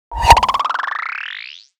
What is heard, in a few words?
Pop Riser; reloaded samples